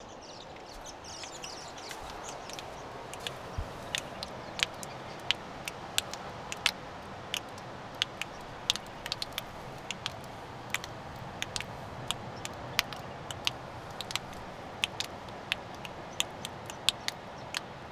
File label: Drops; AUSTRALIA; Les Cartes Postales Sonores; NOISE ON EARTH Vol7